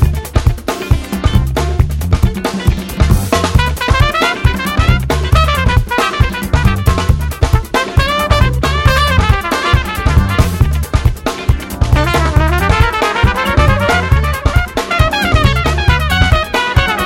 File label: Solo